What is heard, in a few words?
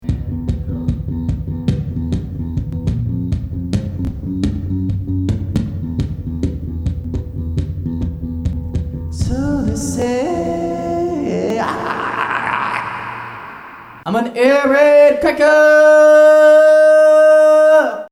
airraid cracker